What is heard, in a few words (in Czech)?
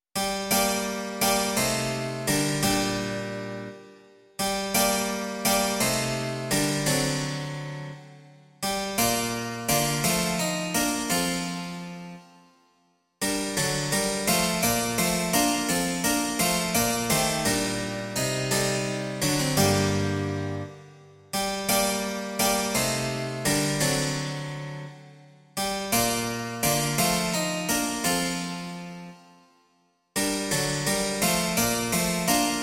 cembalo; Buď Bůh můj veleben; Imaginativní verze